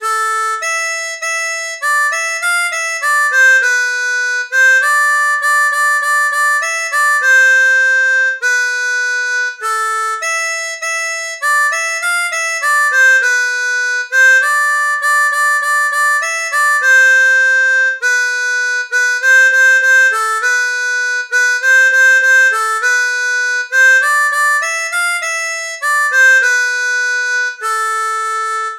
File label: Vyssa lull koka kittelen full, harmonica; Folkloriques